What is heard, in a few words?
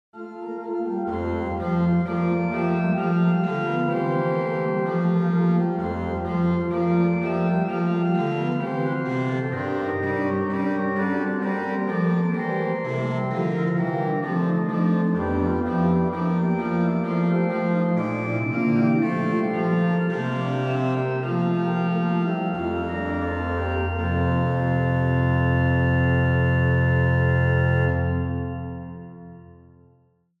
Noel, Organ Music, Souvigny; Boely